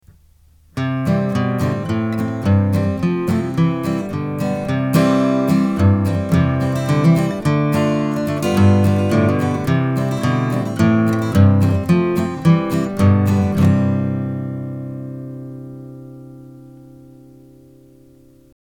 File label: chords